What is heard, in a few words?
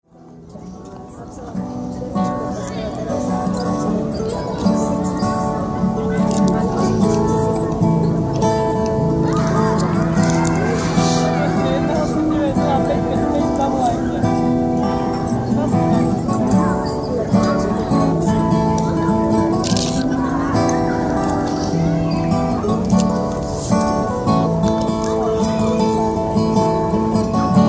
praha park two guys with guitars